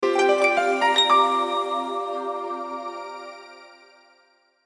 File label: Musical Piano, Musical Piano Harp Pad Reverb; Pianos; Blastwave; Sound Effects:Musical